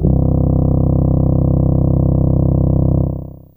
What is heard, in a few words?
Bari C2, Fairlight CMI IIe Reeds